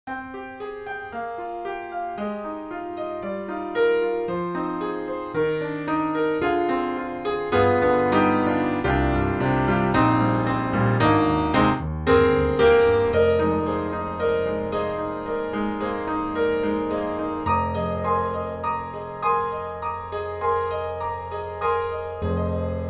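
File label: Chambertone, piano; Soniccouture; The Hammersmith Professional Edition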